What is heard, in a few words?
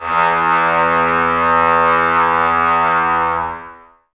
brass, Tbs Mute